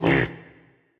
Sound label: grunt; smite; artemis v3 skin15